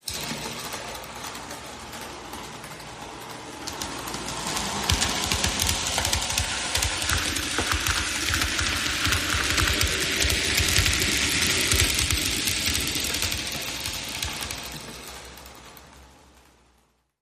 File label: Toyland Train Set Movement